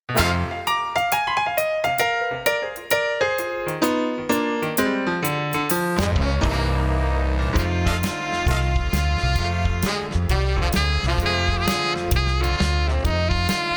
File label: bigband